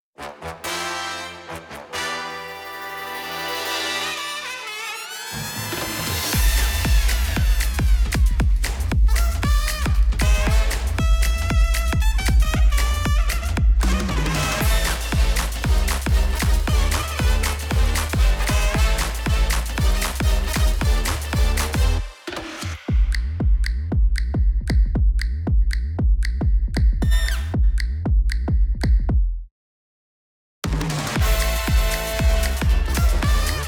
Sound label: inst